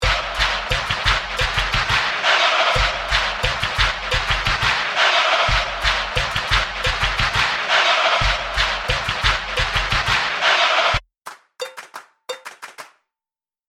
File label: 88BPM; ENGLAND